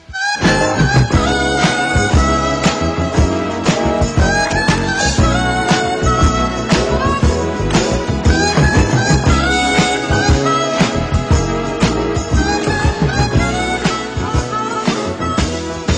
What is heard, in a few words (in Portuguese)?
gaita, Instrumentos